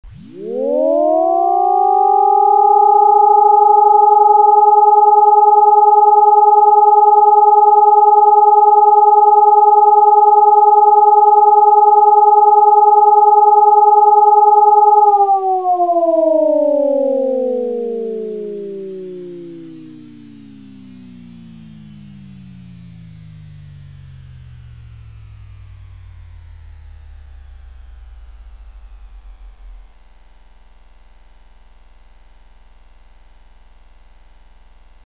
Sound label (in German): Feuerwehr Probe; BOZEN Feuerwehr